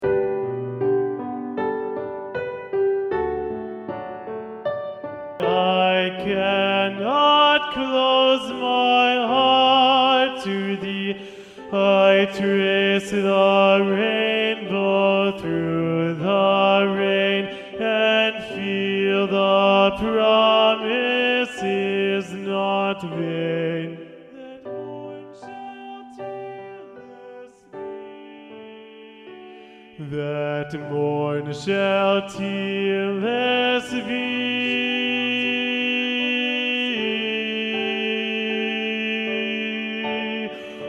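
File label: Bass 2 Predominant, SATB Honor Choir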